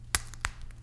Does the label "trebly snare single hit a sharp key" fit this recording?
No